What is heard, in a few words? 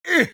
attack; combat; creatures; CosmicRage